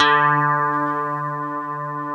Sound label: STRAT CH D3, GUITAR; AKAI S-Series CD-ROM Sound Library VOL-8